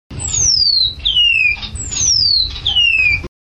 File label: call cock, lavender waxbill